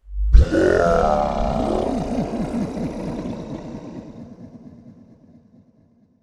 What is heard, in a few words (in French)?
Zom Bruit